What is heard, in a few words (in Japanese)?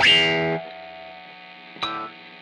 guitarset, ギターを演奏する準備のカット音。